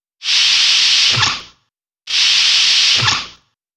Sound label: Door